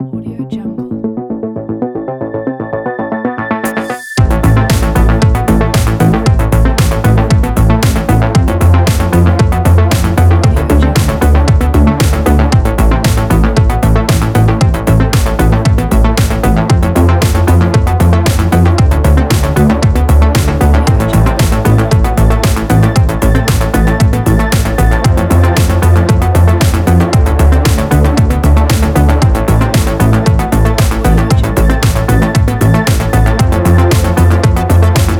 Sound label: electro synthwave